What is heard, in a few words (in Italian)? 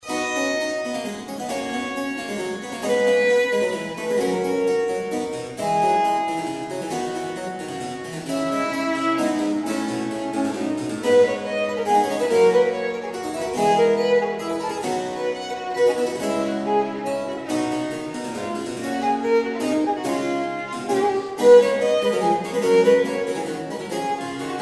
Sonata IV per il violino per sonar con due corde; Biagio Marini; NAWM